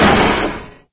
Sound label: move stop no vo; doom3; sabaoth